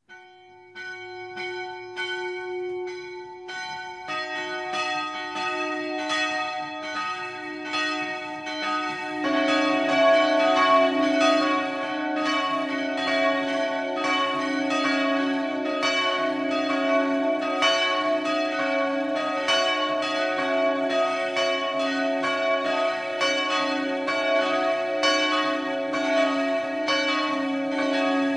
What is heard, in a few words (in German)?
Glocken